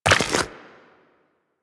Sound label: Step Goo, Footsteps, Walk; Warframe; Tenno